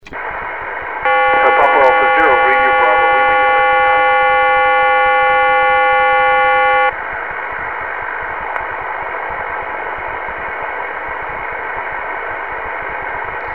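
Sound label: 28500 khz Störungen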